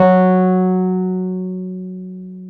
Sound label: PNO, Wurly